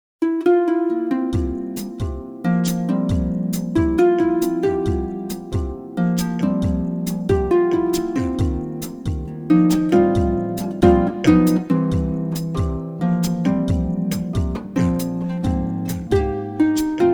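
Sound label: Blues, Jazz Harp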